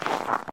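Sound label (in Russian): заледеневший застывший снег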